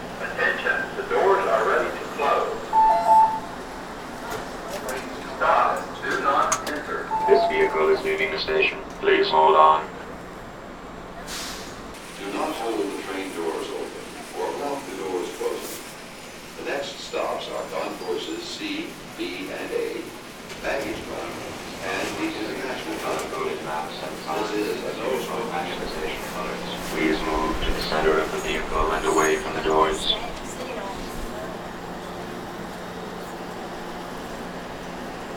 shuttle; atlanta; surroundings; best service Blue Box

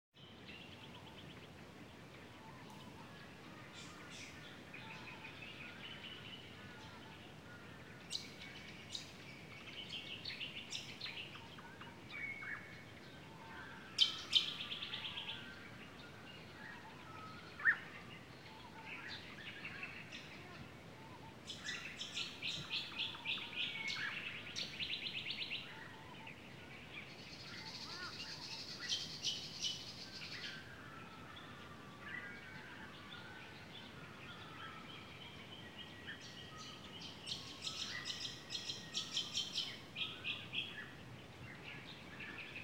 Water cricket or froggs; KMP Film Video Sound Effects

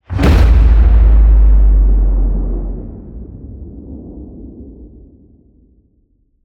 HIT SciFi Low, Cinematic Hits Designed

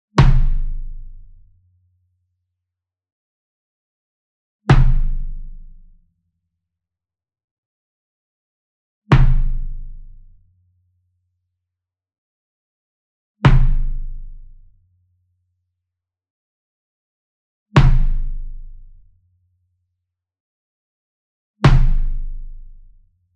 Bass Drum Marching Hit Soft Beater Near; SINGLE DRUM; boom library; Cinematic Strikes Construction Kit

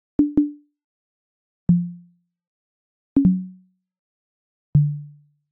Arcade Click Negative

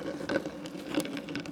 Roll Plastic Soda Cup; SFX Kit; Foley - Misc

